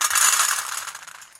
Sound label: pouring food; minecraft; technic